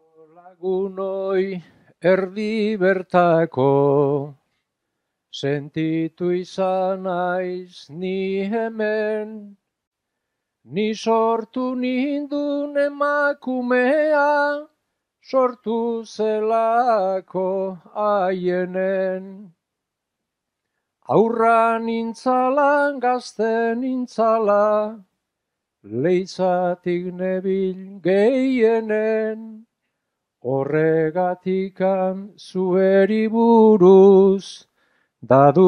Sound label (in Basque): agurra, bertsoa; leitza